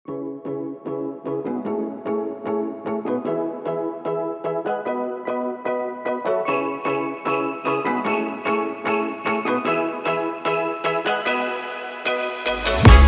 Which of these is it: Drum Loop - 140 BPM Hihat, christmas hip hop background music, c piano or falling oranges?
christmas hip hop background music